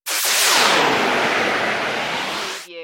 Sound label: Scifi Production Element - Friction Static